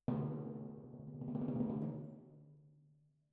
Timp Main

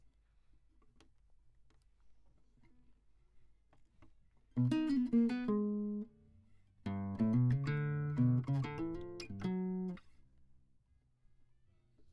Classic Guitar

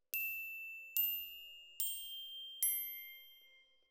Glock Close, Jazz for piano

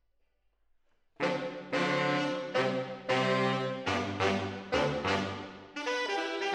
MKH AB, piano

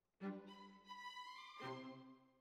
Cellos Over

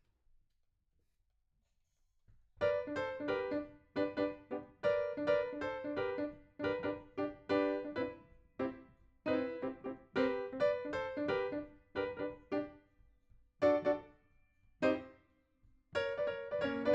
PIANO, RNR for piano

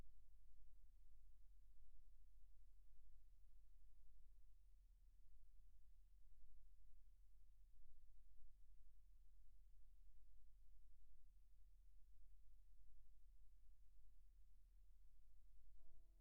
Sax

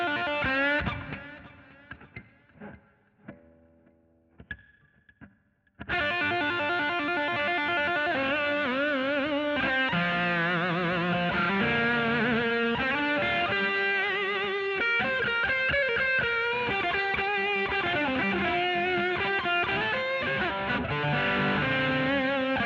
GTR B Solo

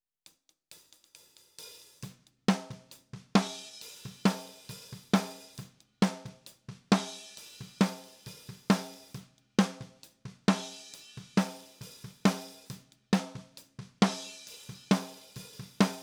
Snare Top

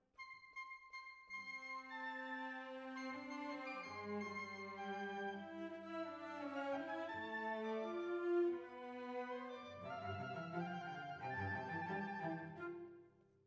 Violas, jazz for piano

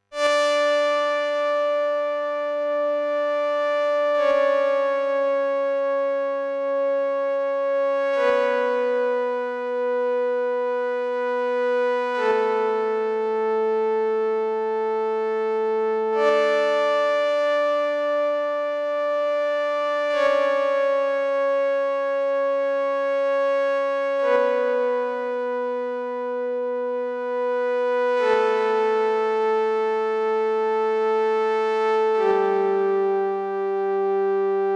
Synth

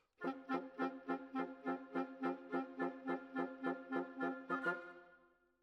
Clarinet

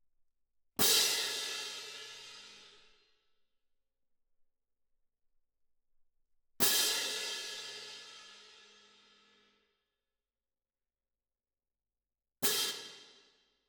Perc

